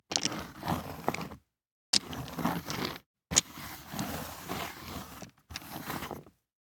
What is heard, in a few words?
DICE on Neoprene, Slide, Standard, 4 Four Dice; Samples; ArticulatedSounds; DICE Sfx Party (Extended)